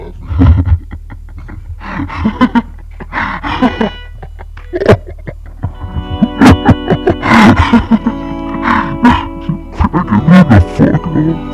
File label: laugh